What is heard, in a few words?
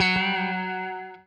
ROKABEND, Guitar & Bass; 90 s Sample CDs; Quantum Leap - Guitar & Bass